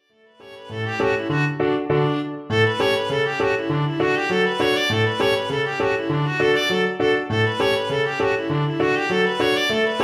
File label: scale reel vla